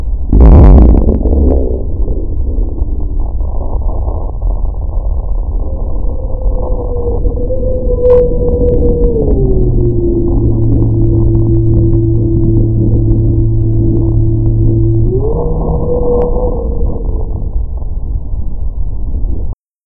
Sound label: tremor loud; volcan